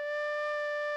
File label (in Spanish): flauta